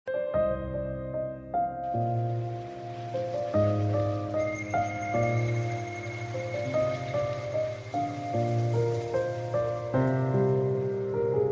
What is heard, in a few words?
instrumental ringtone